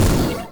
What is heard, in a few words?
MOVE; C64 Dreams; Multi-Sound Default